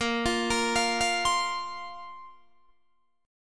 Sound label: A sharp Suspended 2nd down Guitar DADGAD